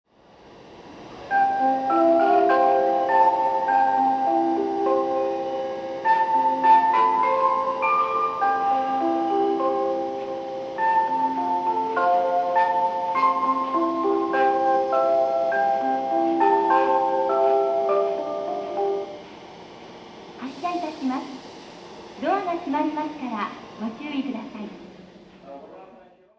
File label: akabane melody; jreast; utsunomiya